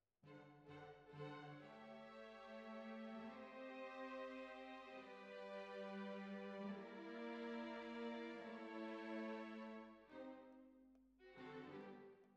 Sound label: Bassoon, Jazz for piano